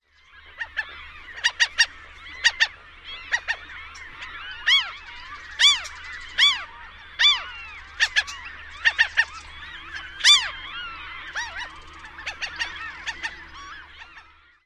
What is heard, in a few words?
alarm calls